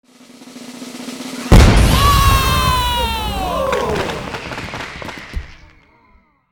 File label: Summary Complete, ui; aronwk collection; ndaudio